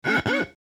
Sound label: Finger Squeak, Finger Squeak On Ceramic Plate; Wet Finger Squeak On Ceramic Plate , 2 Finger Chord; Squeaks